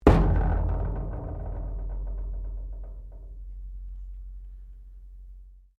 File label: METAL IMPACT RATTLES; Metal (Sound Effects:Materials)